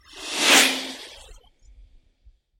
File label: car passing